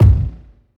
tight lows kick drum g key, Royality free kick drum single shot tuned to the G note.